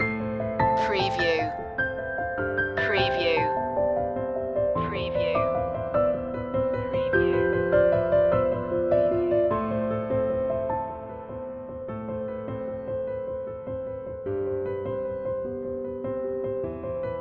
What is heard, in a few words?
love and heartbeats loop